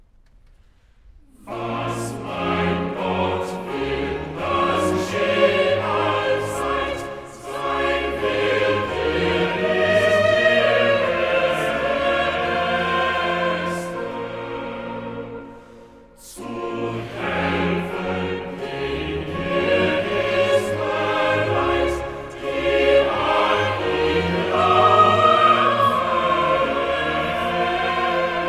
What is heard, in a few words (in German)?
Choral; Cantata, BWV 144 'Nimm, was dein ist, und gehe hin'; Bach; Naarden; Septuagesima